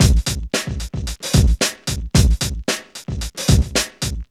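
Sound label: 112 bpm, Funky loops; Zero-G; Funky Elements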